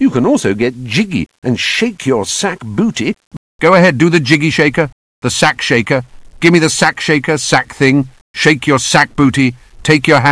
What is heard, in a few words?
sack shaker